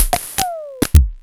Electro Harmonix Drm15

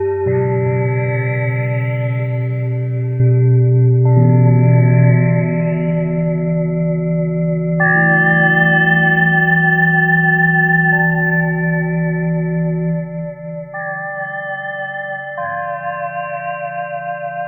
Gongs, Dark Organic Processing; Sonic Foundry (Sony Creative Software); Loop Noir

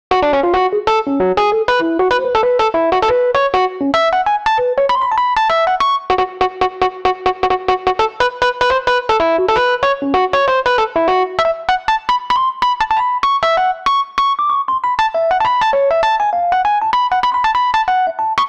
Rhodes Solo, Cinematic Funk; Sony Creative Software; Urban Tone Poems; Solos

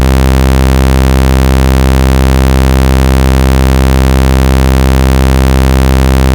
303 VCO SAW; mikey303 ravepack

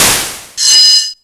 Found Sound, Drum Machines

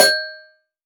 Metallic Thing, snare

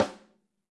Ludwig Snare

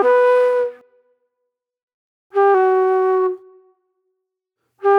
Pop Flute

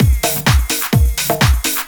Latin Loop 128 BPM

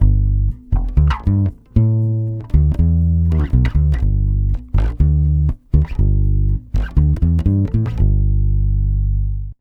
120 BASS G, Brazil Bossa; USB Soundscan; AKAI

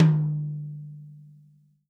TOM, Real Drum Kit

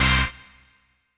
CHORDS; EastWest; Dance Mega Hip Hop Electro

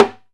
Timbales Xswitch; E-MU Producer Series Vol. 2 – More Studio Essentials; Composer

